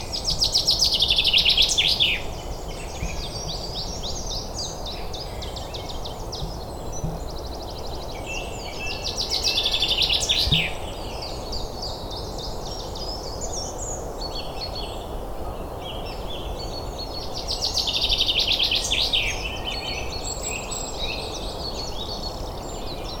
txonta, Pinzón común, Fringilla coelebs, Canto; Observatorio de aves; TXONTA ARRUNTA

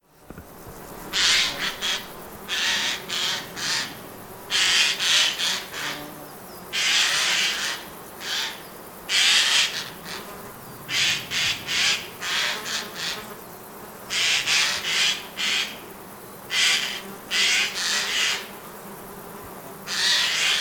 eskinosoa, Arrendajo común, Garrulus glandarius, Canto; Observatorio de aves

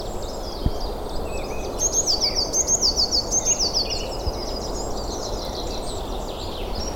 amilotxa, Herrerillo común, Cyanistes caeruleus, Canto; Observatorio de aves; AMILOTXA URDINA

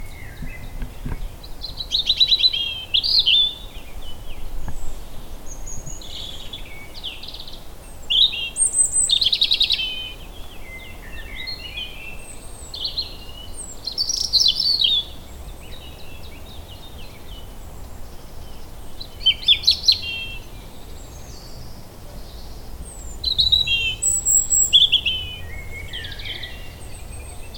txantxangorria, Petirojo, Erithacus rubecula, Canto; Observatorio de aves

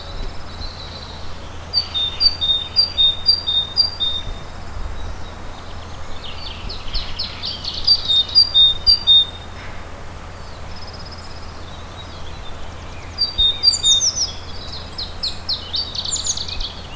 kaxkabeltza, Carbonero común, Parus major, Canto; Observatorio de aves; KAZKABELTZA

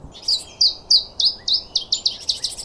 txio iberiarra, Mosquitero ibérico, Phylloscopus ibericus, Canto; Observatorio de aves

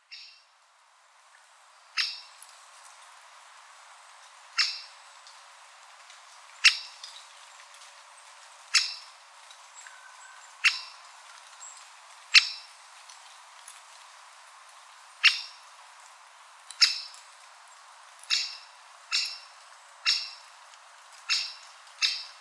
okil handia, Pico picapinos, Dendrocopos major, Canto; Observatorio de aves

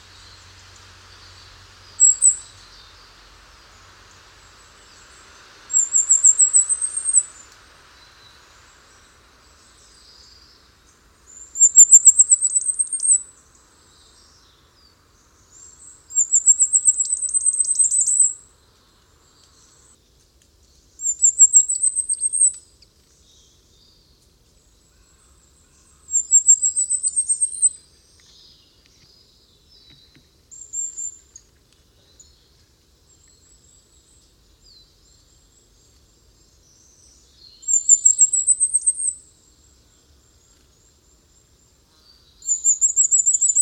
erregetxoa, Reyezuelo listado, Regulus ignicapilla, Canto; Observatorio de aves; ERREGETXO BEKAINDUNA

